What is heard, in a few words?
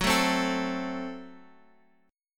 F sharp Diminished F sharp, Guitar Open F; strummed; x,x,6,4,3,4